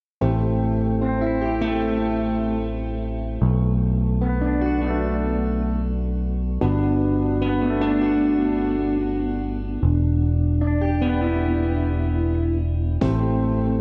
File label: Lofi